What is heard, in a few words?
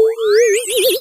angle high; eiffelmedia; brick breaker